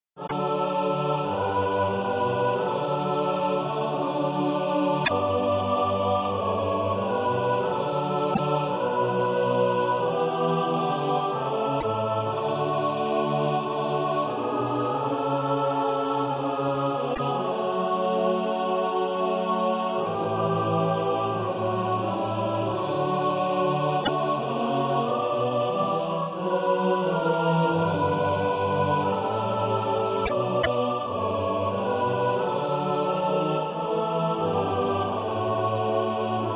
mixed

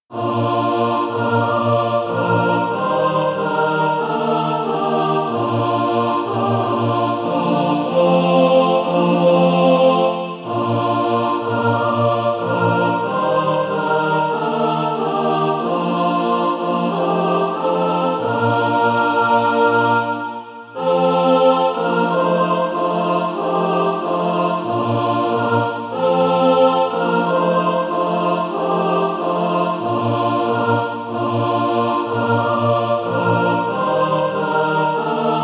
mixed

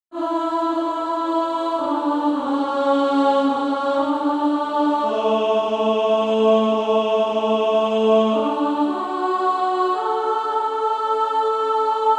Female Choir A1; Christ Liveth in Me

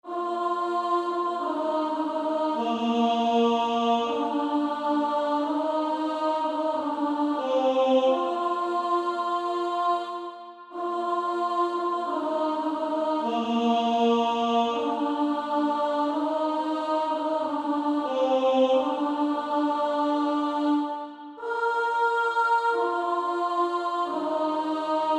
Female Choir A1; Pass me Not O gentle Savior; 1st Alto

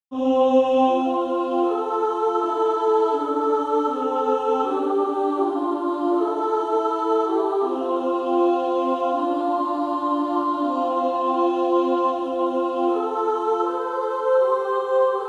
Female Choir

